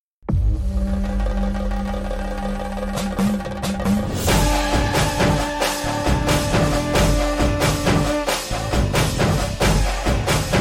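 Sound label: background music ringtone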